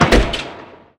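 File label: Se Dungeon Door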